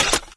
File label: laser minigun clip in; custom weapons; cso